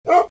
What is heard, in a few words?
bark, wolfdog